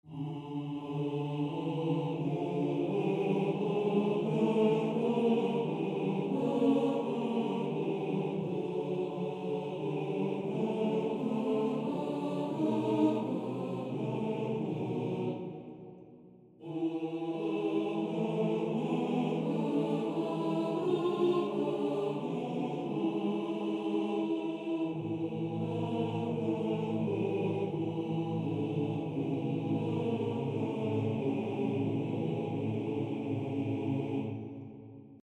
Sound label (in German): Modulation tutti